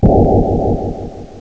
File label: circular shock; Powermanga